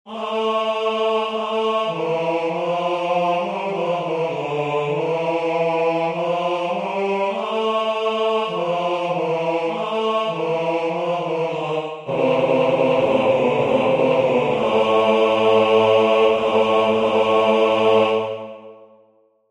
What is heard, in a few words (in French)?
basse voix